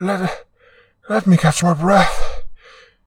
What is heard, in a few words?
Vulnerable; AudioWizards; Mezafort